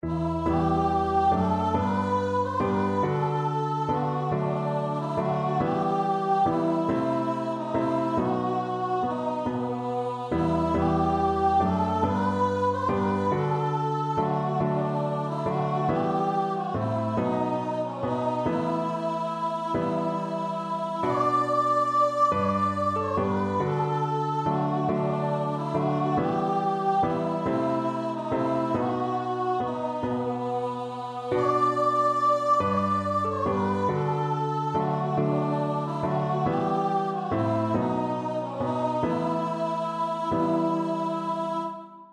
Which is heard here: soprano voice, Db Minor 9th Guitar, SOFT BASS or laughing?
soprano voice